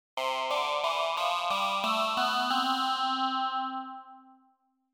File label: vocal